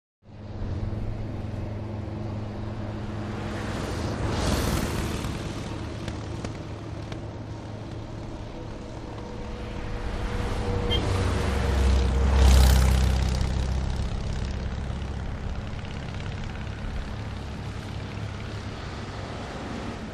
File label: Traffic; Highway; Mid Day Highway Traffic.